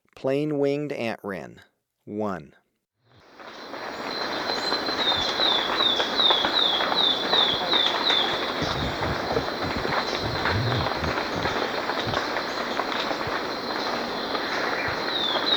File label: Plain-Winged Antwren; S. America